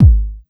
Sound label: Kick Hit, Bmin; Hip Hop; Hip Hop Exotica; Apple Loops; Big Fish Audio